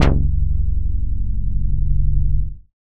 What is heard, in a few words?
F-0 Lead